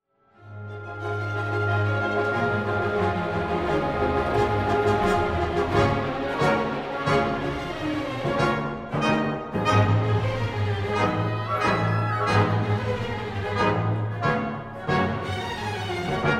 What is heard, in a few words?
Fanfare